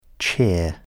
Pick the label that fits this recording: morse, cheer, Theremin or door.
cheer